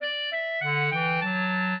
clarinet; Mozart

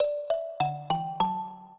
minuet, mbira; Mozart

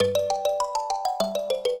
trio, mbira; Mozart

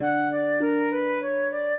minuet, flute harp; Mozart

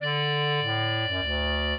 minuet, clarinet; Mozart

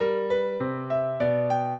trio, piano; Mozart

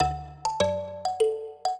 minuet, mbira; Mozart

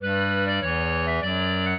clarinet; Mozart

